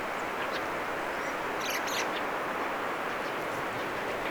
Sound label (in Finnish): rakattirastas